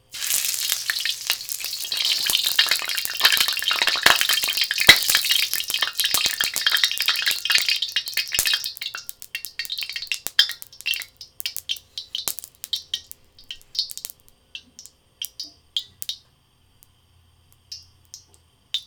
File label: loud wok